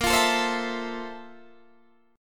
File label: F Major 7th, Bb; Fmaj7/Bb; strummed